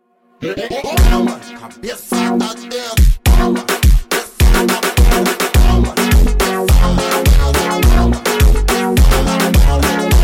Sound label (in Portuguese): Rkt Version slowed